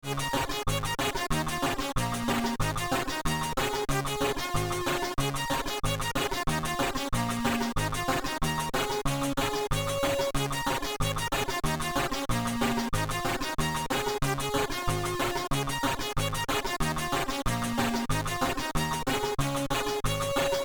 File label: Ragtime, sid